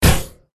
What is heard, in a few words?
jelly fish boss attack contact mid